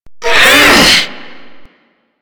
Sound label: Hammer Boss Exertion, Vocals; Warframe